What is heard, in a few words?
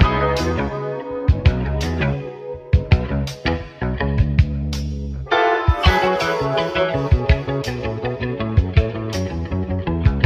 guitar bass kick is present, Phonky Loops